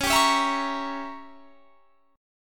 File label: Db Diminished 7th Db down; strummed